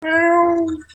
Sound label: meow